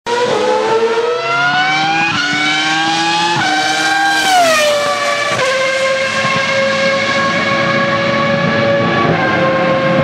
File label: Racing Sound